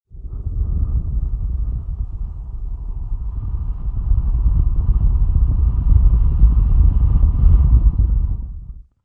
Rumble, Sweetner - Low frequency wind-like rumbling; Evil; Horror